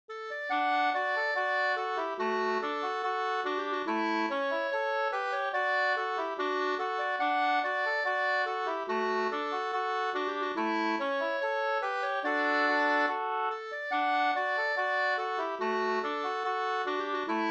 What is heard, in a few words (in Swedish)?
skanklat